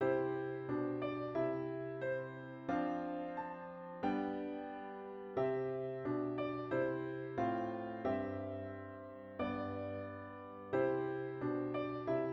Harm altered major

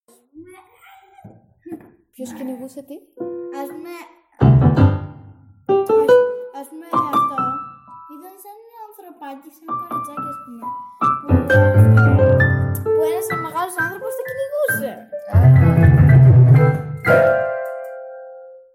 synths impro beat

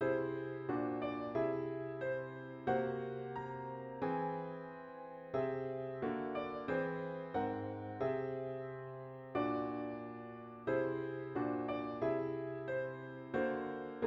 Harm altered wholetone

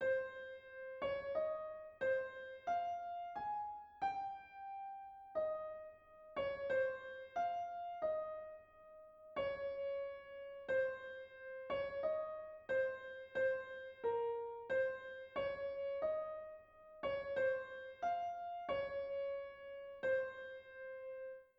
Melody Phrygian

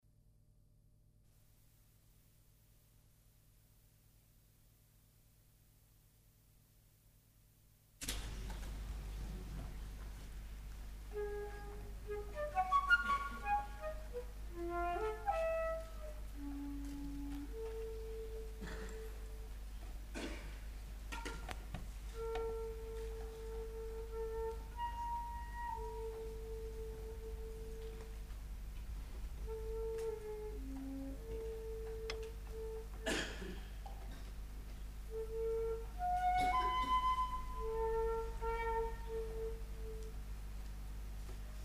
flute

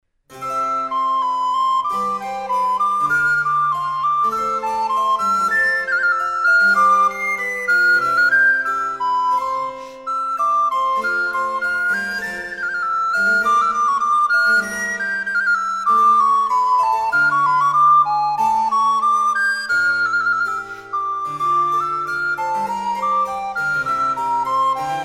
Sonata, BWV 1033 in C Andante Presto

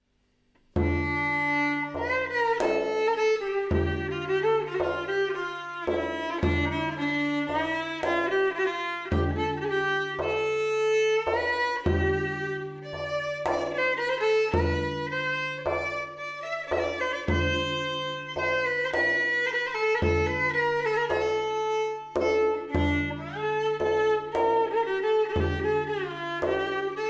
Hüseyni Saz Semâî

